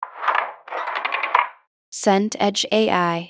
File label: drawer open wood